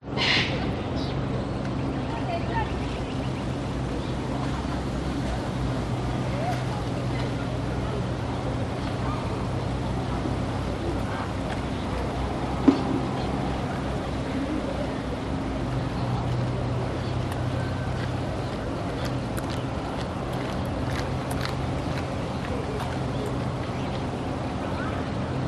public places park botanic gardens birds few people wind trees distant city hum